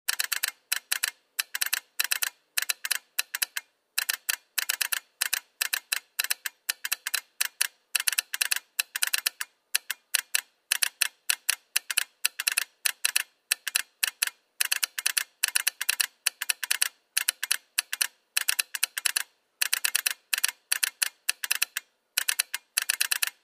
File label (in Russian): zvuk mehanicheskogo telegrafa moreze otpravka